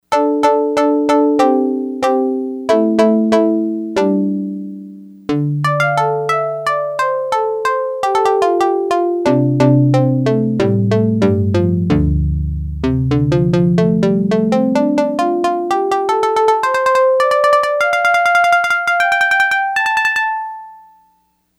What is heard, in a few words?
Koto koto, Yamaha DX100; Factory Patches